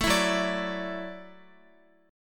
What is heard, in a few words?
Gb Minor 7th Sharp 5th, Guitar; Gbm7#5; strummed